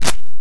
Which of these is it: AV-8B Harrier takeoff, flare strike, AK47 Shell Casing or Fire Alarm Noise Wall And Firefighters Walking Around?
flare strike